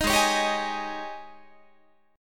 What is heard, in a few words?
Ab Major 7th Suspended 4th Ab down Guitar 4A 4E 5A 5D 5G sharp 6C sharp; AbM7sus4 strummed